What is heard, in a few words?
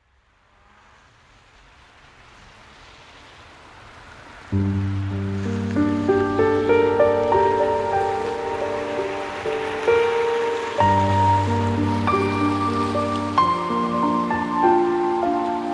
Piano and Rain